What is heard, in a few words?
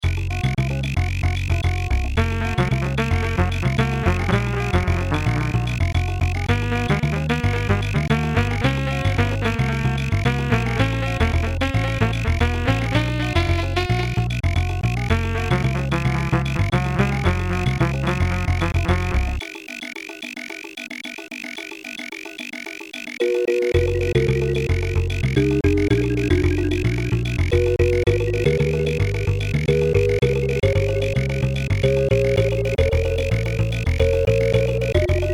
Steel Drum Rhumba; Diddy's Kong Quest